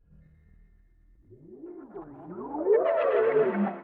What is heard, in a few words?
ethno, 125, A